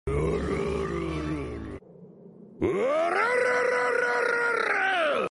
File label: laugh notification tone; kaido